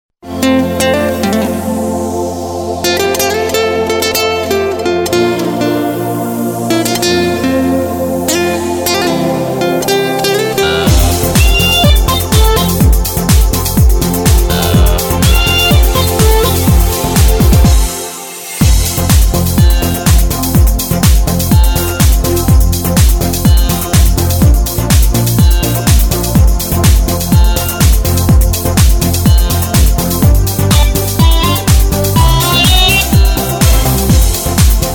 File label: Korg Pa3X